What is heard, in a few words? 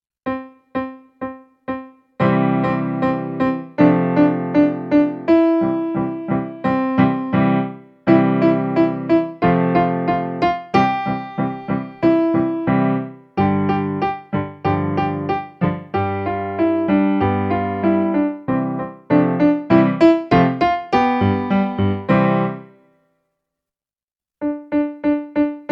Whole Notes